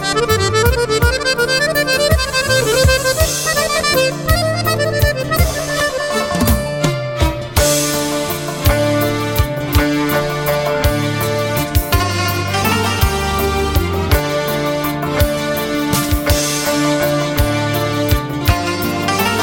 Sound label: Accordion